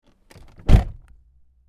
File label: car door close internal; spoteffects